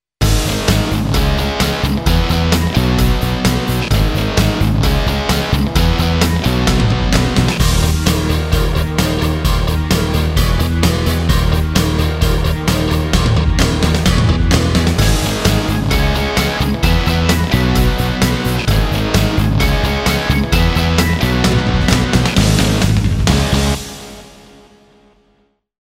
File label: TRANSITION POKER